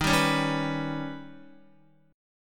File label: Eb Augmented 7th Eb, Guitar; strummed; 4F,4A,5C,5G,5C,6E